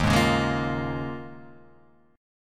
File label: D sharp 9th Suspended 4th D sharp, Guitar Half Step Down